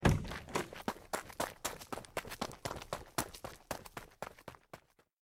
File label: Run Away From House